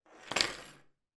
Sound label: household key car electronic throw on table and slide